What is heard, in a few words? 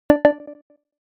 user join